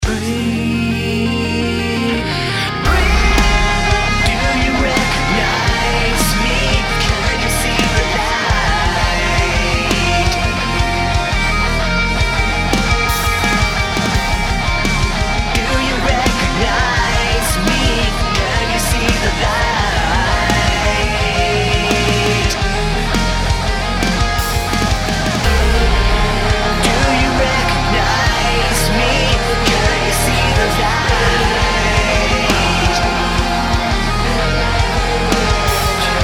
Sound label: New Drums